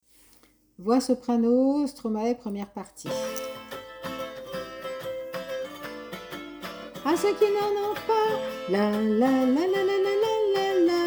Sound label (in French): voix soprano